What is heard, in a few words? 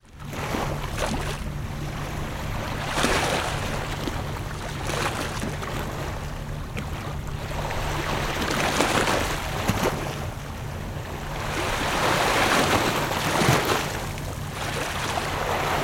water ocean waves med on stone sea wall boats harbour in bg